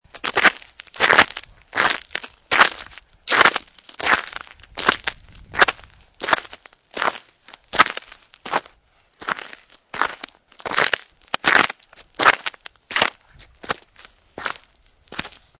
Snow And Ice Footsteps Ice; Weather

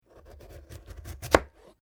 Carrot cut; Food Prep; Restaurants

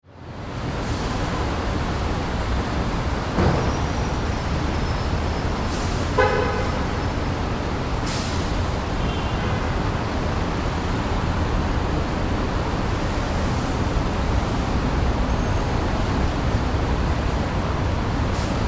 Ambience City Rooftop Perspective; Cities

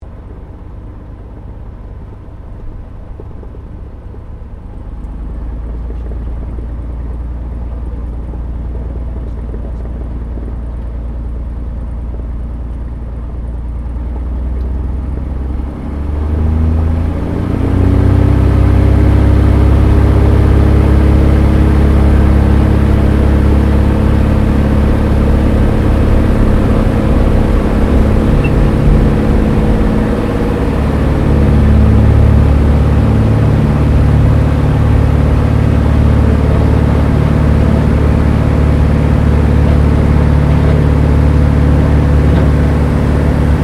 AIRCRAFT CESSNA O-1 BIRDDOG TAKEOFF INTERIOR; Cessna Birddog spotter aircraft; Airplanes; Vehicles

